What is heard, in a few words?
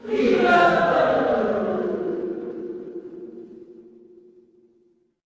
crowd cheer